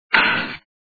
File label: ball bat